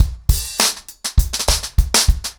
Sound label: 100BPM, ACOUSTIC DRUMS; The Roots Drumloops